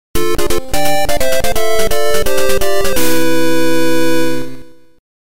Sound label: Game Over; dreamland